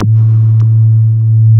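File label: wall moog, bass; Spectrasonic Distorted Reality 1; WALL MOOG BS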